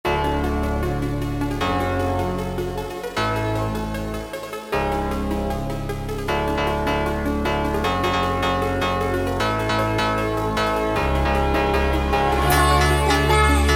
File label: Funk sped Up